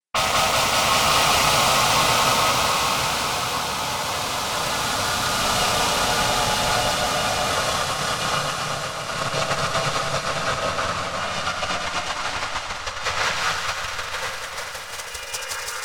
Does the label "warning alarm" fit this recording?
No